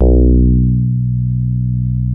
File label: DEEP FM H2, DIGITAL BASS; Music Store - Claudius Diemeter Schwerlos